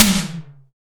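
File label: SDS V SNR, snare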